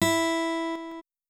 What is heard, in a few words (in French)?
clavecin